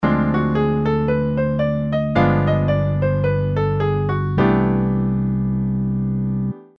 diminished scale half diminished

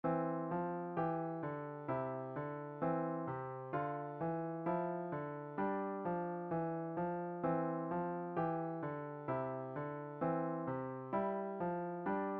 Coordination, piano exercises

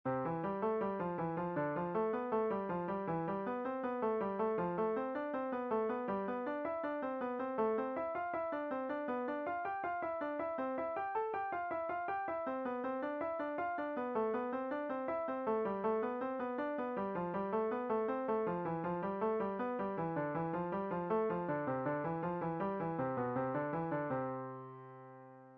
Five finger stretching, piano

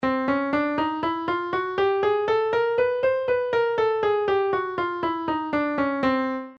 chromatic scale

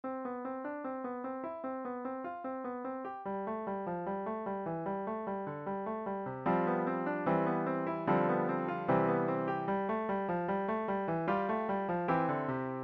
Crossing 2 over 1, piano

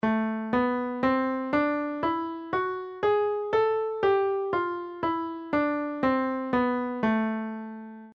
A minor melodic, melodic minor scale

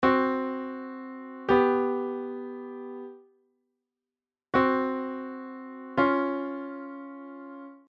aug 4t dim 5th, music intervals

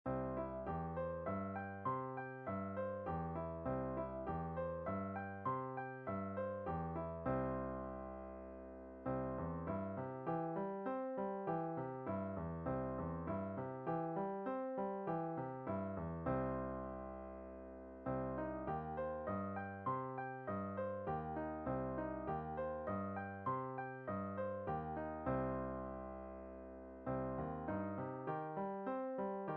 Arpeggios Exercise

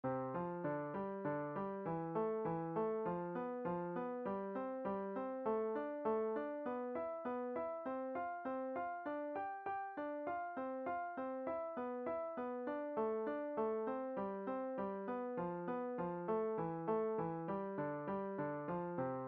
Five finger Intervals Fourth, piano

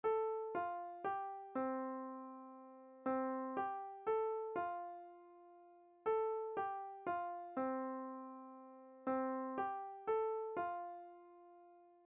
Big ben chimes play piano by ear

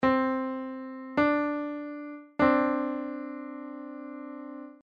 major 2nd, musical intervals

